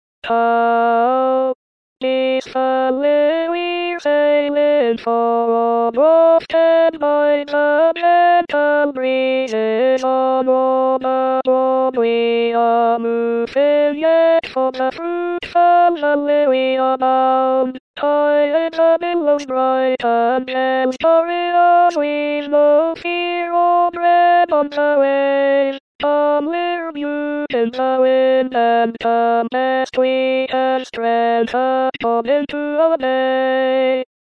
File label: digital voices alto